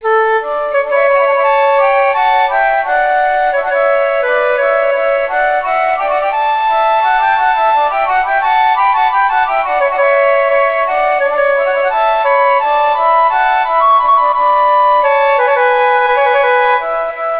FLUTE

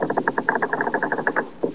Slide Clatter; Professor Fernleaf from the Tropics

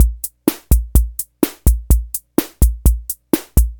126 ROCK

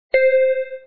Check Point; crazy ambulance

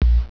BLOCK

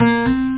melodie, guitarras

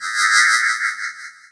Program Error; Wormageddon

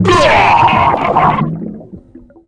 Exclamation; Quake III Arena; Visor; Super! Geek

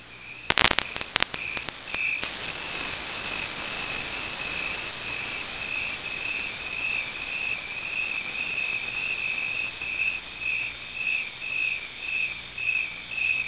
crickets

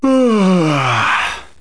uaaaaa

